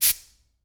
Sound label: Industry Tool Air Escaping From Air Compressor Quick Burst